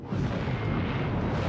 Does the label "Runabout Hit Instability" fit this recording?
Yes